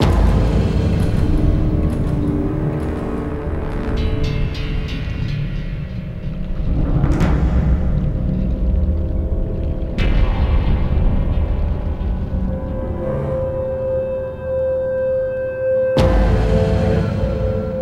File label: Boss combat; Bosscombat Ch06 03